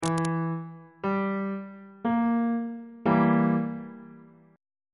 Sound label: d double sharp diminished chord on bass clef